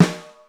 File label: RING SN, DRUM; BEAT2000